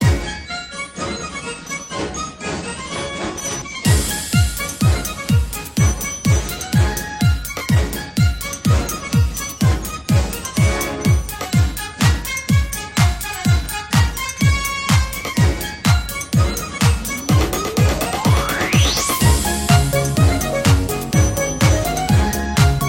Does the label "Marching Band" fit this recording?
Yes